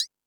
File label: House Machine, Drum Machines